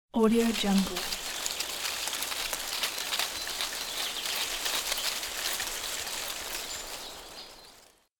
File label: slow dry leaves rustling